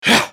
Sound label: spell cast effort; Lucian